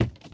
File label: plaster, footsteps, run; player; source engine; left 4 dead 2; boomer